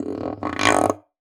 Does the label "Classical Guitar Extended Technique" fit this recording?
Yes